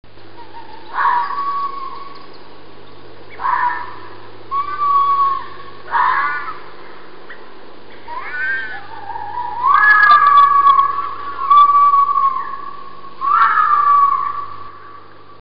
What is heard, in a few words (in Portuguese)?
raposa; raposas fazendo sexo; Sexo irritante